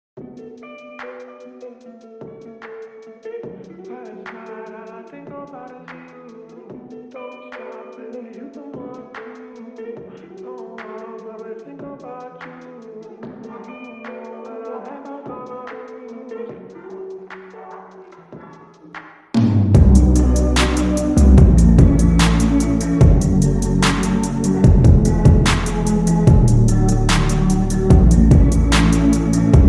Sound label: Slowed Reverb